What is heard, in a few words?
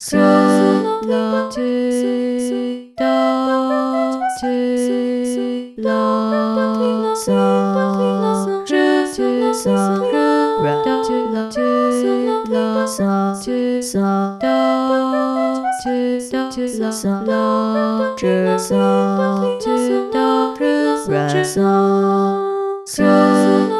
minuet in g major